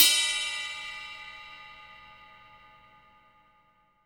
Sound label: CYM Ride; Best Service Gigapack 2